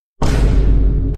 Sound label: fast vine boom sound